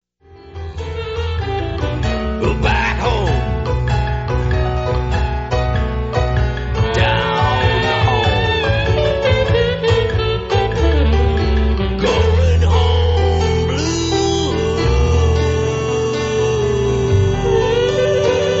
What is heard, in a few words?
Blues